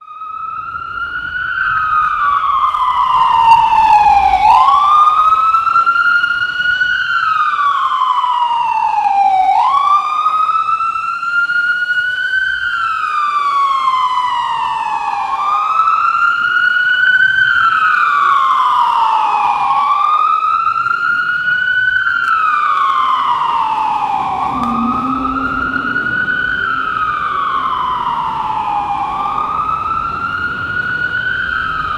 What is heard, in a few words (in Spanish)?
caos en la ciudad gritos, ambulance